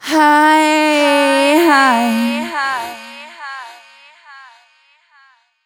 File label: f 140 mel b-flat, fem Vocals